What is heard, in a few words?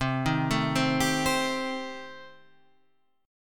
C Minor 6th, chord